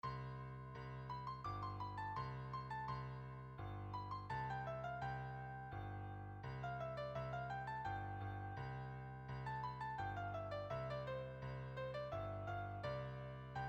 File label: Melody Bass